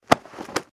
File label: grab ball